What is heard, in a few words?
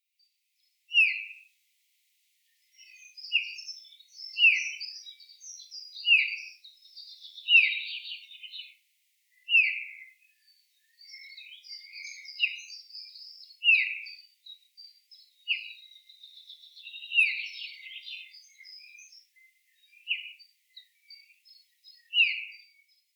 Eurasian Bullfinch Pyrrhula pyrrhula; chant des oiseaux des jardins